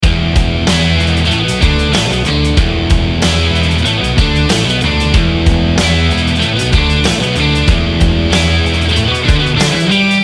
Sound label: Rock Machine Loop